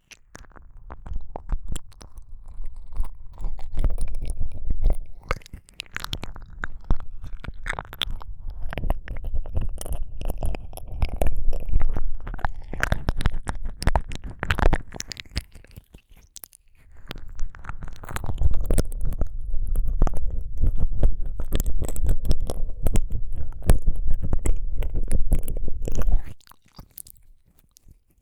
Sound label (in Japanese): ねっとり系耳舐め, ASMR